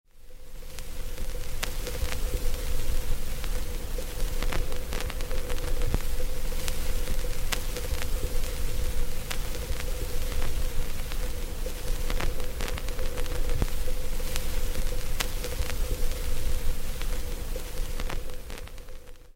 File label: Record player static